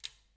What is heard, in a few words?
Back Pedal, Bicycle